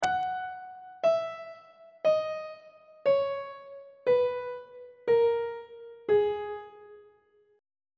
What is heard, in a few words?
a flat minor scale descending on treble clef